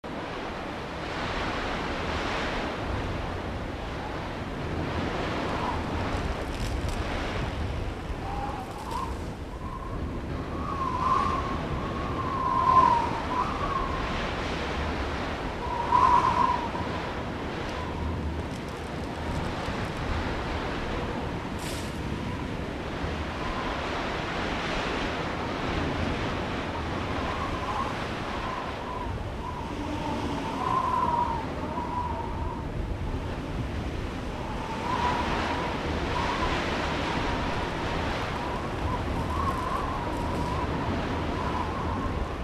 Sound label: Amb Cold Wind Ext; mohaa